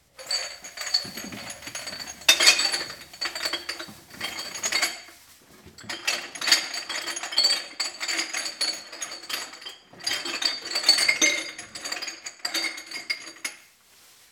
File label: Bottle Collector